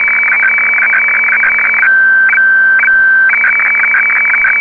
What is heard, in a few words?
fax, office; Fax 3